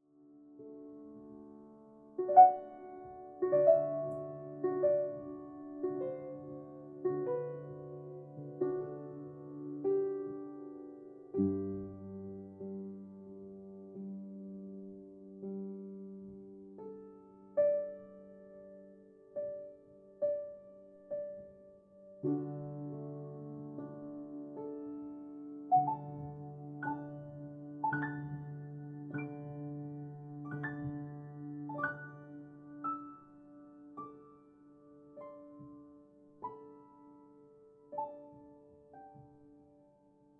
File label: Celestial Rest Soothing Piano Sounds For Sleep